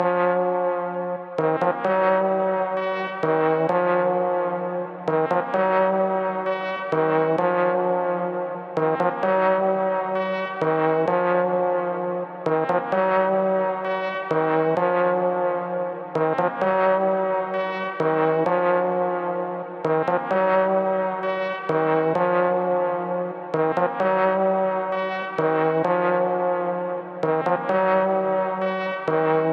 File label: 130 BPM A# Min Trumpet; Melody; Trap; Loop Stems; Gremlins